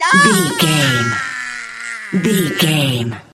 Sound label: voice male death high